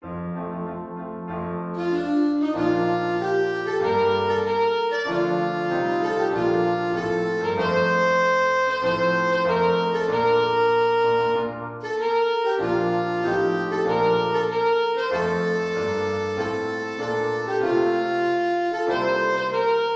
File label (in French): hymne national de Belgique; van campenhout francois